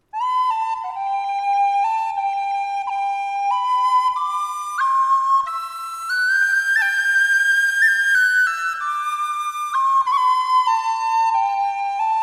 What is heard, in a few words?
whistle brass air